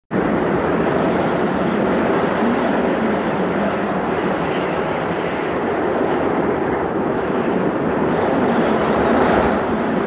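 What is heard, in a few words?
blizzard sounds; zvuki vjugi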